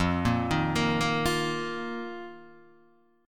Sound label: F 7th Suspended 4th F, Guitar, DADGAD